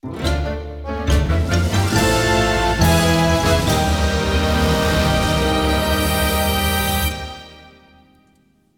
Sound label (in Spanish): Fanfarria Derrota; magic twins